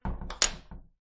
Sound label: household door metal latch close